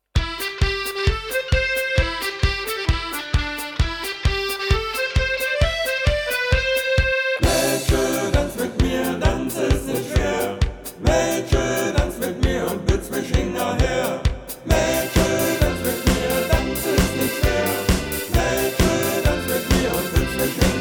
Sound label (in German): Tutti